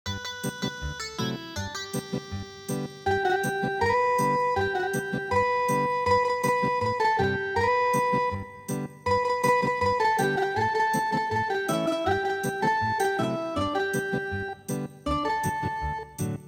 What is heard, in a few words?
Ethnic Guitar